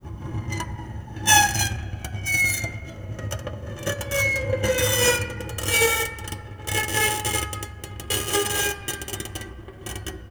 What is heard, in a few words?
String Scrape, Piano; Piano Miscellaneous